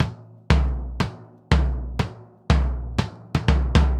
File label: BOMBO, DRUMS SAMBA; Best Service ProSamples vol.14; AKAI